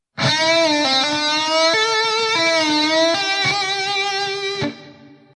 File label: tapping band